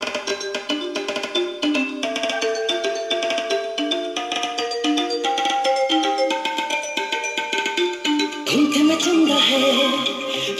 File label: Indi Music